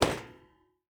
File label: footstep barefoot metal jump land; Rust